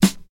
modern dark sounding acoustic snare g key, snare single hit tuned to the G note; snare drum samples